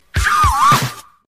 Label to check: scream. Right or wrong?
Right